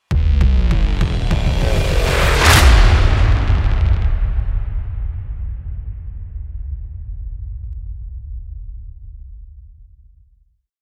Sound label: Production Music Trailer Tools Buildup; CATastrophic